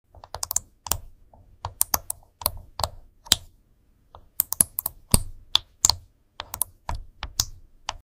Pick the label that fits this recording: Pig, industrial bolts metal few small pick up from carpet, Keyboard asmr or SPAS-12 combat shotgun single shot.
Keyboard asmr